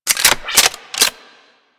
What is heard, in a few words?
Shock Rifle Reload, weapons; Warframe; Corpus